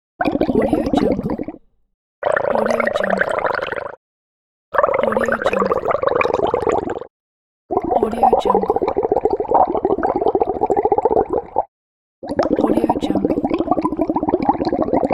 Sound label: water bubbles